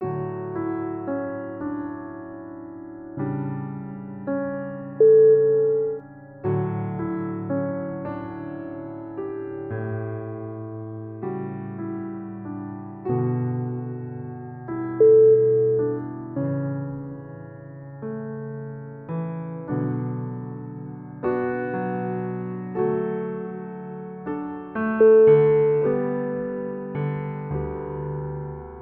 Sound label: peaceful classical piano